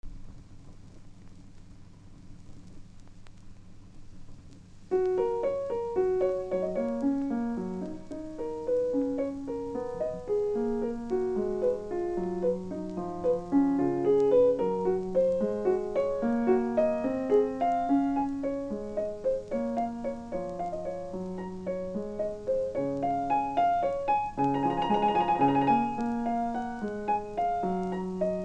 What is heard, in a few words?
Prelude No. 13 In F sharp Major; Bach; WTK 2; F.Gulda